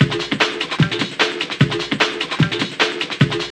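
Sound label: drum loop; X-Static Goldmine 3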